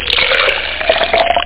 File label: drink; StudioJ++; Sun Microsystems Audio File; 8,000 sample rate